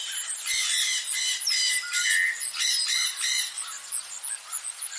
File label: frog croaking; Animals